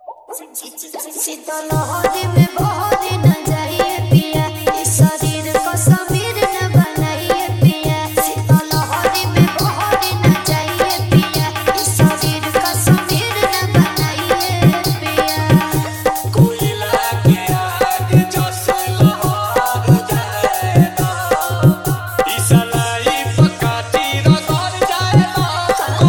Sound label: Dj Song Full Bamfar Hard Bass Mix